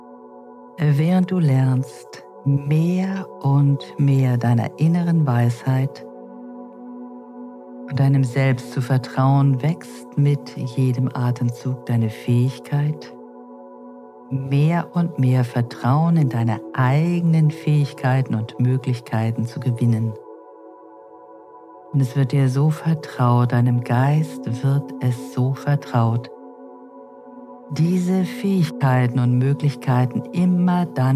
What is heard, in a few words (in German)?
Musik; wenn trauma dick macht